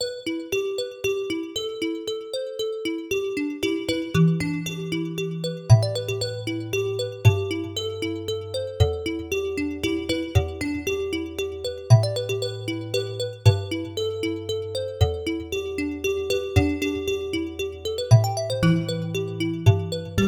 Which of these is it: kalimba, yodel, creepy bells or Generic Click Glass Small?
kalimba